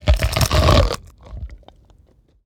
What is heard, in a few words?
creature crunch; Bluezone Corporation; Organic